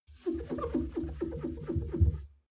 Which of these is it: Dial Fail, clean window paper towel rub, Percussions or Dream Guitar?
clean window paper towel rub